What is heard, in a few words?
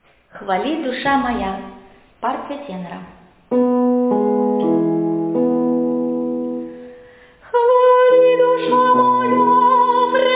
tenor; Xvali dusha moja